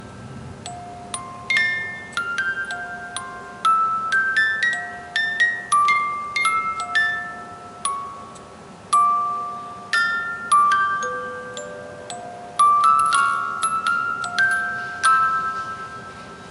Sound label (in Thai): กล่องดนตรี; My Sassy Girl